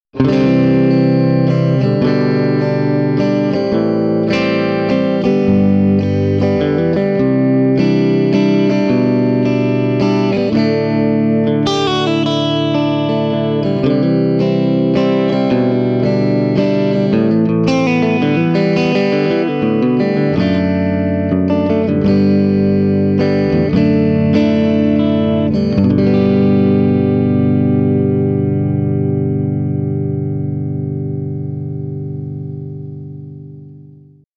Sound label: Swart Amp